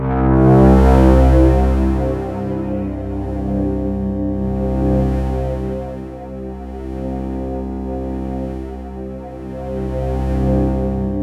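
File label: DEEP ATMOS, Kurzweil K2000; BASIC-KW2000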